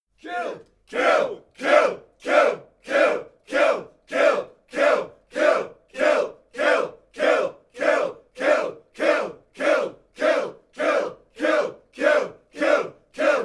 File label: chants kill